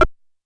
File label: Perc Hi, Stylophone Beatbox